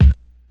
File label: hip hop kick c sharp key; 1990s